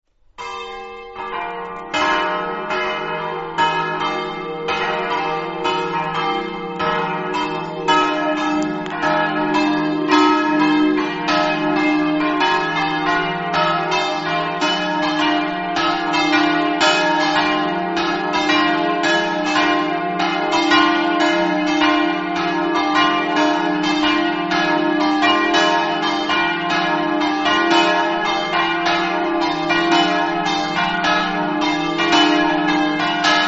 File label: campane; porzus